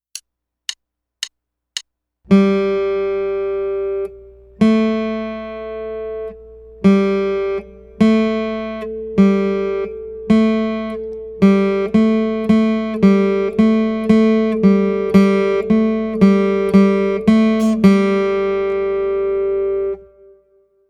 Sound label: A Study On The Third String